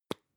lipstick open plop soft; haus-garten; badezimmer

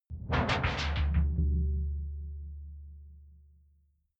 sci-fi alien tech big; swooshes-swishes

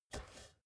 step ladder metal grip single; schritte

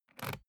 briefcase plastic latch lock key insert; industrie wirtschaft; bueroarbeit

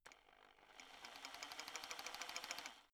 rock drill chisel settled short; schrauben drehen hydraulik

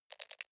click erratic wide; signale

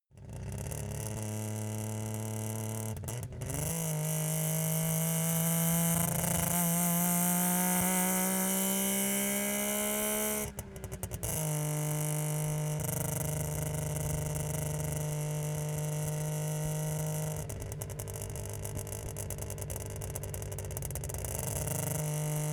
car saab 92 drive low stop exhaust

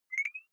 beep click high, signale

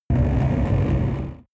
extrinsic drone storm; energie

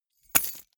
key ring large drop carpet hard

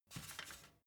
step ladder metal soft single; schritte

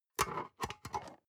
storage lid slide close medium, box; objekte

